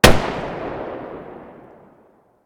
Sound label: svd muzzle mono far